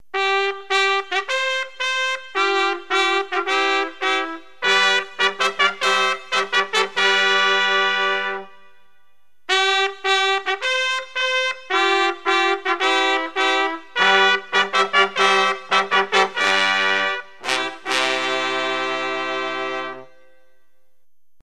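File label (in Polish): hejnał; Głogów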